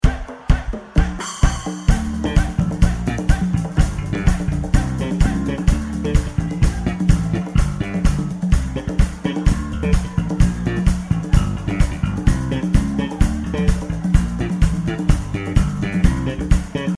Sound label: slap; somnus